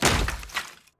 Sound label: environment object barrel breaking